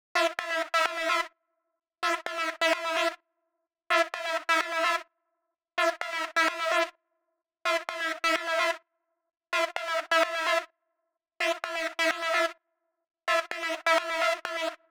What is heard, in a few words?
synth note 3, Key E, Bpm 128, Big Room House; Loop Nerds; Big Room House Allstarz